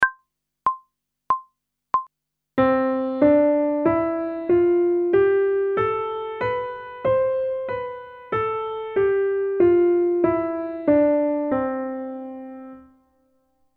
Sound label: Major Scale one octave ascending and descending, pop vocals; grade 2; Technical Work